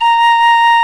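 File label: Penny Whistle VIB A#1, Exotic Winds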